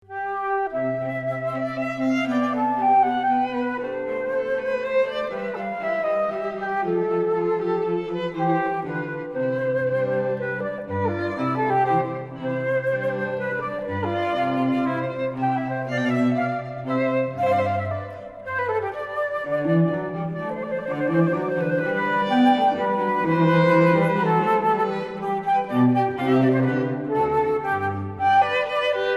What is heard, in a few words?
Flute Violin Cello Trio